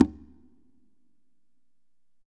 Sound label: Congas Wet; Drums Percussion